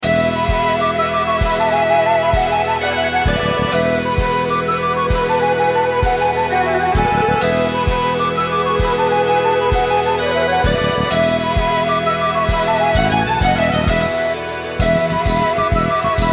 bgm village; goken

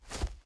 Footsteps Dwarf Dirt; we are the dwarves gamerip 2016

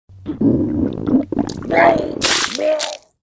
food poisoning

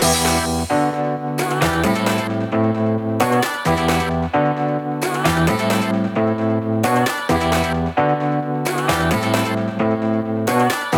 Music Main Menu Options; Sonic Momentum; SAGE 22

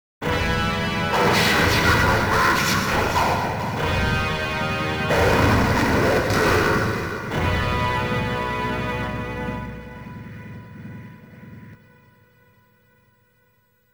Game Start; king of the monster 2 the next thing neo geo cd

